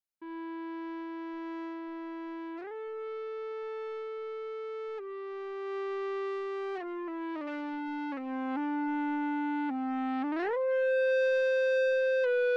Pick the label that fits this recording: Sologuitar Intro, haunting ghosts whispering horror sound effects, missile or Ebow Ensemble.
Ebow Ensemble